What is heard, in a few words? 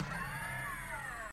Robo Turn